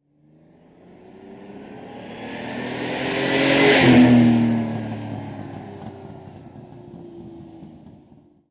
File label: Car Sports Car Medium